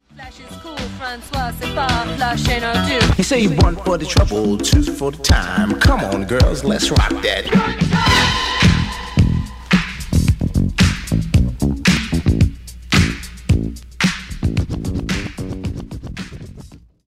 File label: hiphop